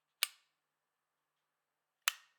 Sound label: Light Switch